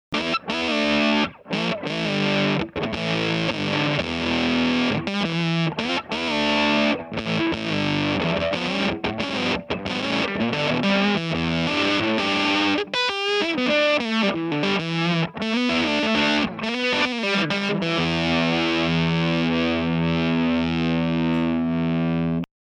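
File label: mxr gain variac 6v; 03h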